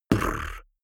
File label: beatbox bass drum tongue roll damped